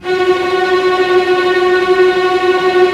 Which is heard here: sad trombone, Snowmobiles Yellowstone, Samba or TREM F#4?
TREM F#4